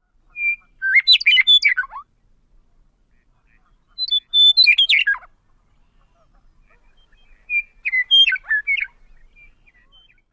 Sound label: song